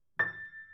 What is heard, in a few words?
Piano Ff A6